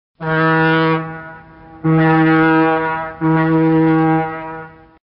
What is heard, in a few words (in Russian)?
Gudok Parohoda